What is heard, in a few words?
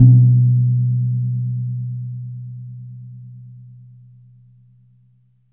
JAVA GONG B2, GAMELAN; Spectrasonics; Heart of Asia